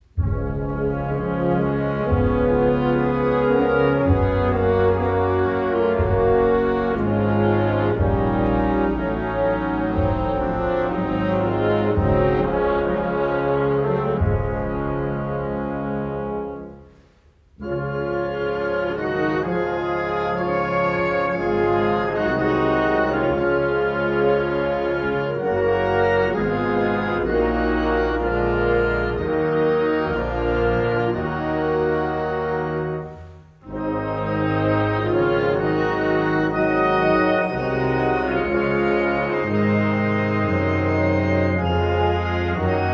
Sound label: anthem